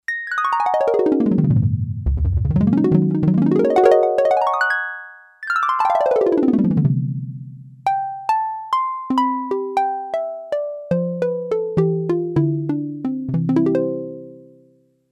Yamaha DX11 Harp; Factory Preset Voices

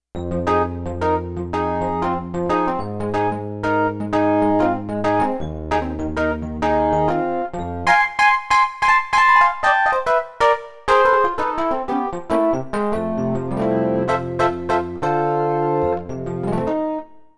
Curly Wurly, Roland VC-1

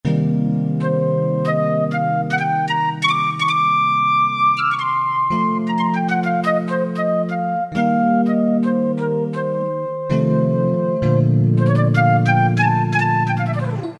Flute-Piano Duo, Roland D-50; Factory preset demos

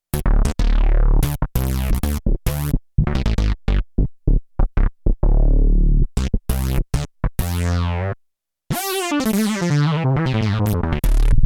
Wet Bass, Roland JD-800; Factory Patches

